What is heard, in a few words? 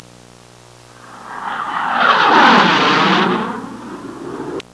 JET, aerei; trasporti